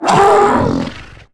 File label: bantha hit; Star Wars Knights Of The Old Republic I; XBOX